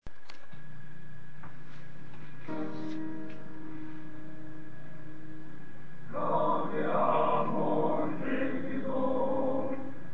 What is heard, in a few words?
Choir; Gaudeamus Igitur